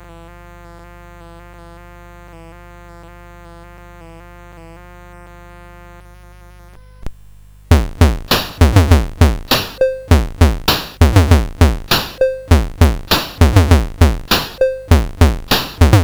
Radical Drums, CSG8580R5; SoundEffects

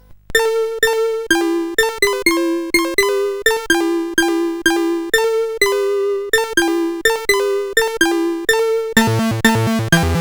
Iron Lord, CSG8580R5

